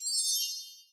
money

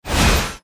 effect buff

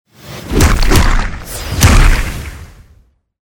attack; hero

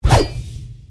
sword

